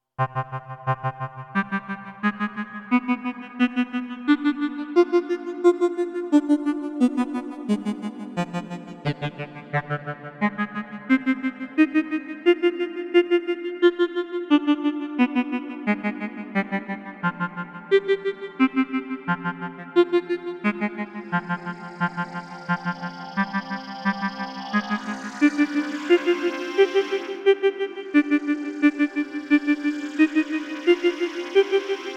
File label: noise; drone